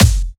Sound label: Kick, dubstep; Ghosthack - AC2024 - Day 13 - Dubstep Sounds 2025; Frost Kick